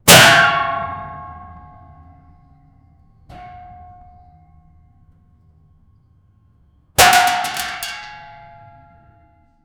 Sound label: combat